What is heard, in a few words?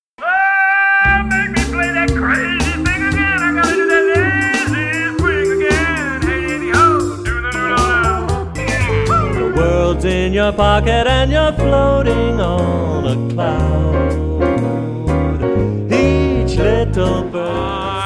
singing